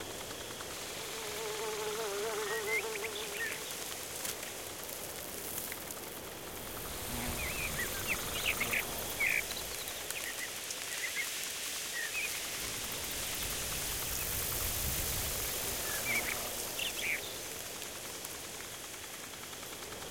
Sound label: Ambiance - Garden